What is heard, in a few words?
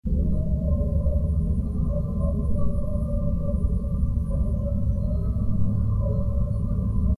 owl